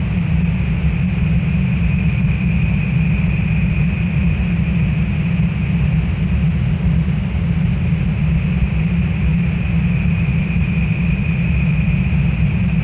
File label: atmos